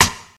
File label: sharp snare one shot e key, Royality free snare single hit tuned to the E note